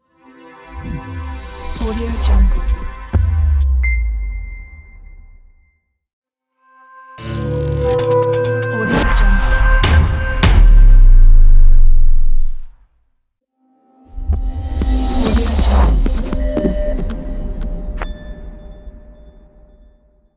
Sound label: glitch logo pack